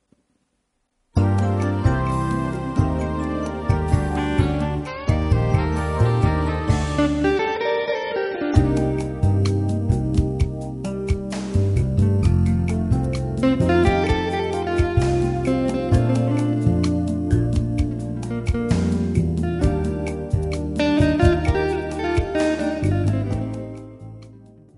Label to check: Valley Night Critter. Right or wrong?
Wrong